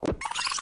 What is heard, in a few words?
Rewind; friday the 13th killer puzzle